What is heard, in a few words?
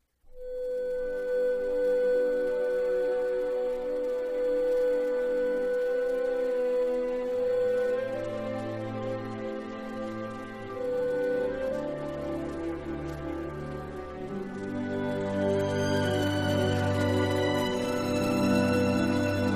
Instrumental